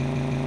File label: muscle car, engine; simpra